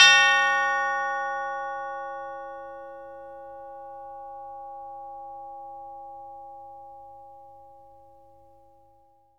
CHIME D#4; Synclavier Percussion; World & Orchestral; ILIO

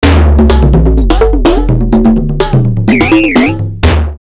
R8 Cocussion 126, DrumMachines; drumloops; X-Static Goldmine

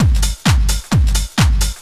techno, DRUMLOOPS

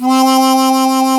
HARMONICA

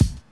KIT, Dance Mega Drums; Best Service

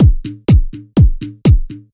Strip 124bpm, House Drumloops; Zero-G House Fabrik; 90 s Sample CDs

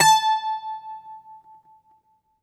GR. BAZOU, Plucked Strings, Greek Bazouki; E-MU Producer Series Vol. 7 – Old World Instruments

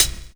XV Hat C, Roland XV-5080

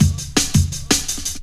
Fat Rolla Cut, Jungle Drum Loops; Zero-G Jungle Warfare 1, 2 & 3

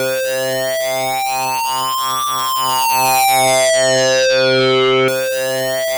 SYN, Drum & Bass, 160 BPM

